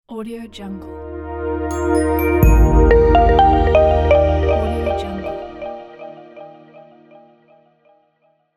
clean logo

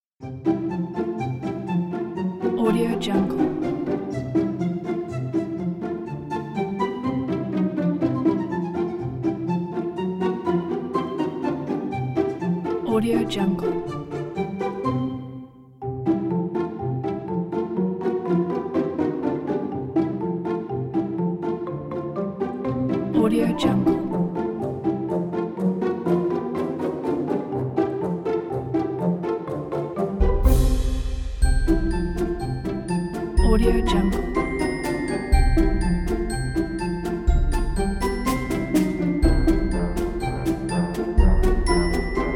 comedy cartoon

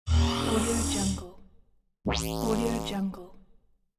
teleportation

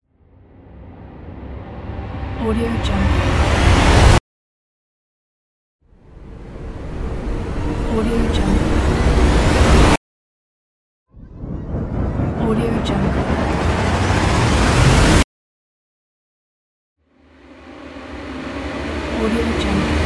riser

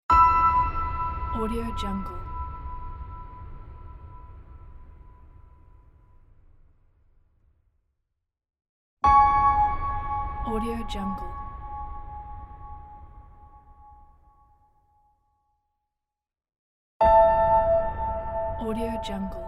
cinematic piano

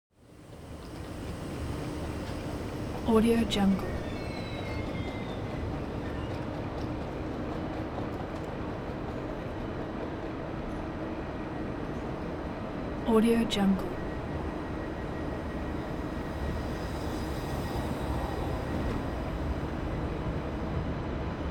transportation train depart

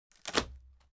object wood break short

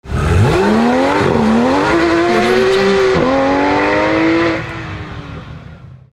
race car driving away

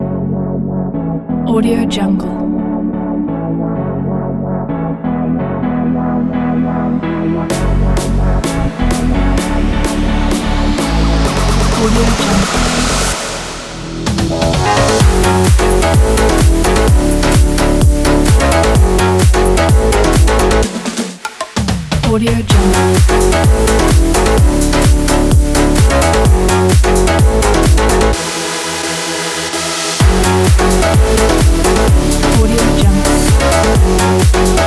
fitness center

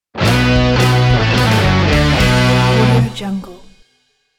heavy rock logo; af-sounds

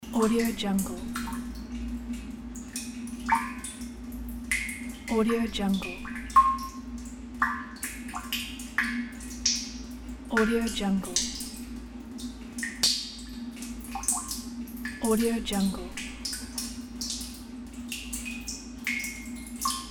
water dripping into tank

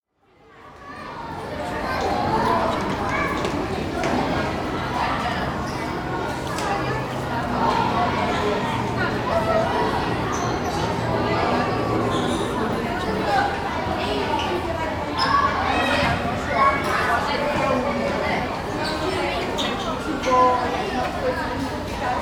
school busy playground crowd ambience

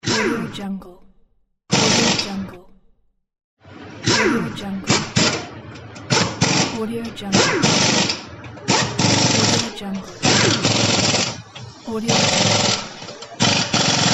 tire shop ambience removing tire sounds; dellostudio

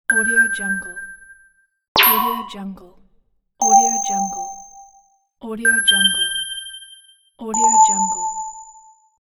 android tone signal; soundwood

